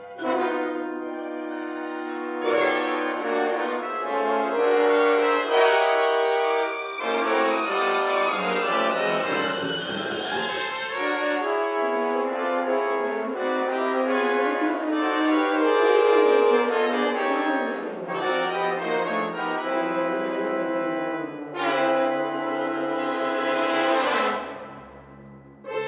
Orchestra Concerto Scurrying

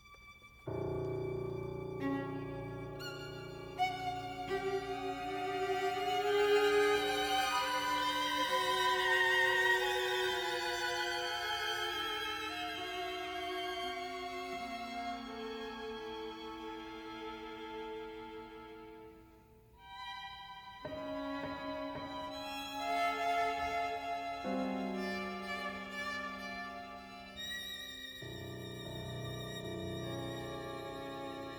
Piano Strings Sextet Fast

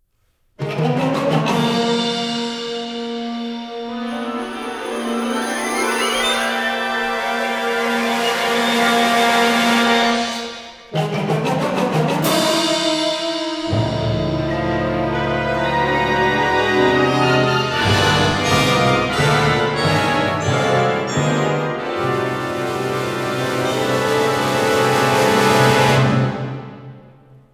Symphony Band; Sounds Shapes Symbols; 1st Movement

